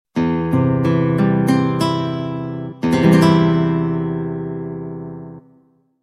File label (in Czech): Akord C dur